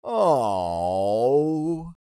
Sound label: Sad male reaction